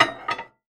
cooking game set down small ceramic plate glass microwave; dish